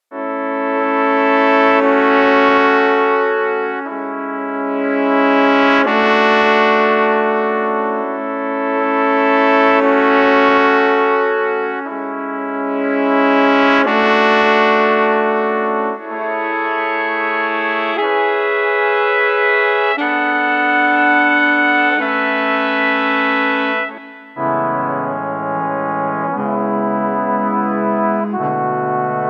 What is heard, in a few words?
Street Brass Slow Lament 90